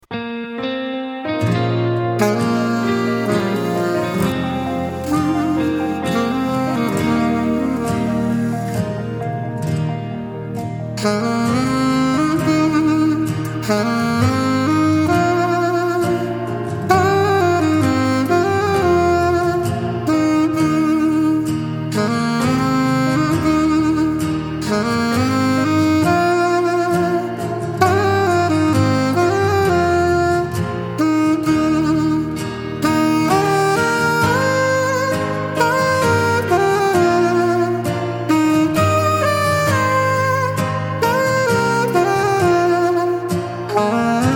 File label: Sax Version